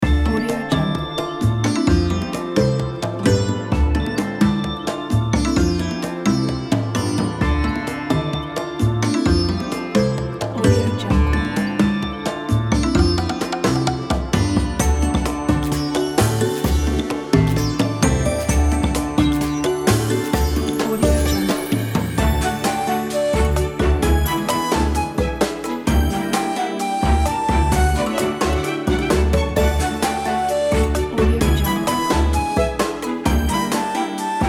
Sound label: forest party loop